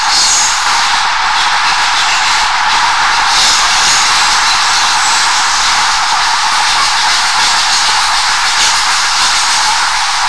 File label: Flange, Train; OpenBVE